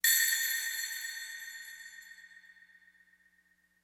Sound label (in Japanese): suzu